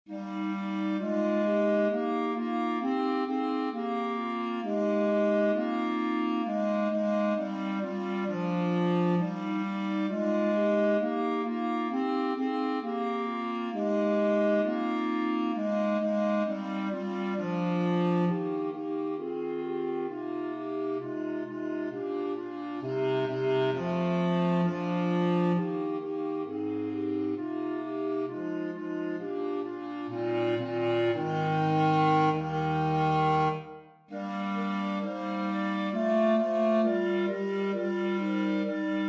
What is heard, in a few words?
Durufle Ubi caritas bas; Durufle, Maurice